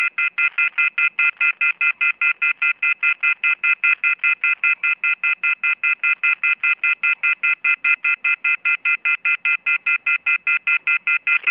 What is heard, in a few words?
Off The Hook Phone